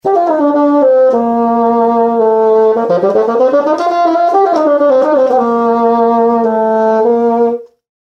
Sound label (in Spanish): fagot